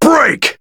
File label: Axe Kick; BBTAG